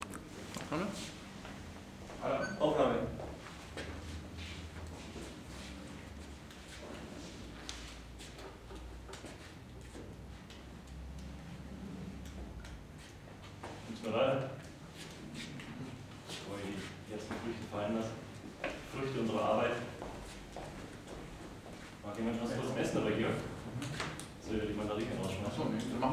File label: Juggling